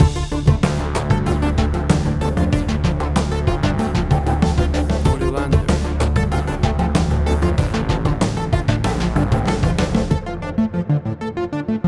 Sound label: Atmospheric Fuzzy Synth Tension